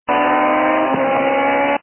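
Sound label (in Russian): звук гонки в компьютерной игре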